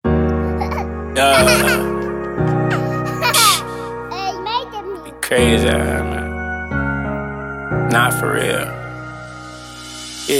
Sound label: HIP HOP; R2R MASTER